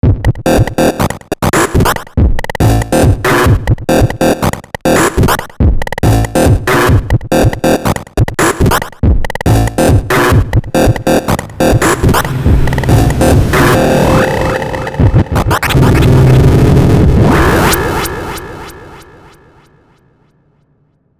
glitch beat

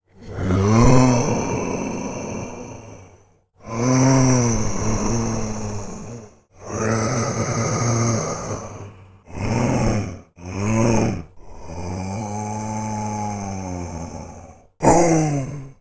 zombie moans

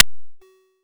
pickup egg; Egg Hunter

